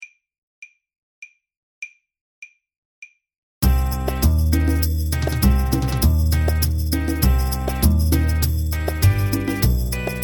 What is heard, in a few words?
French Horn